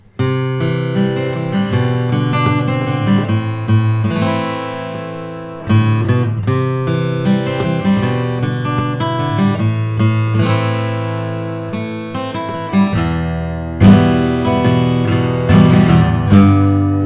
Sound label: Collings OM3 1 3/4 nut natural